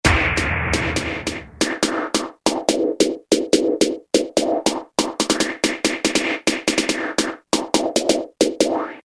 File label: Cut Off Snare; Roland M-DC1